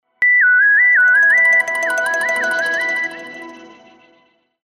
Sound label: custom notification; Explay; Atom